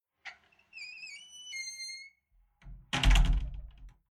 Household Door Old Wooden Door Hinge Squeaks Then Closed Rattle; Tom Hutchings